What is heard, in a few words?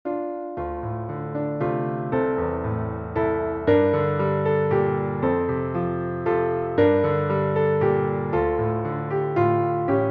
Sherwood Forest, piano solo